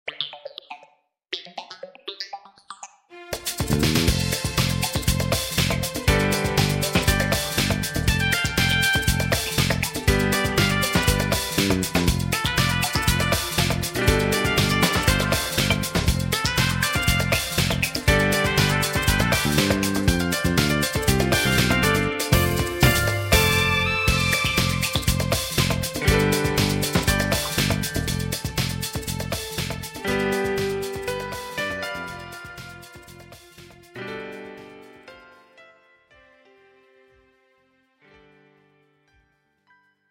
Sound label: samba